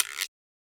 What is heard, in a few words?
Roland Juno D Perc Menu Kit